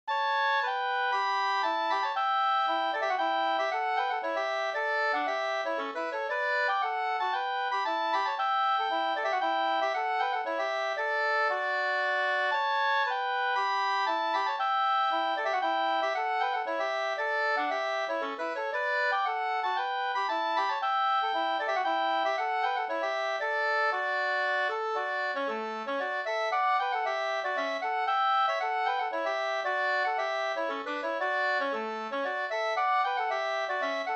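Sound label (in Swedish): polska, tvåstämmig; Orust; Josef Pettersson o Ernst Abrahamsson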